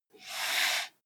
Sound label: computer mouse draw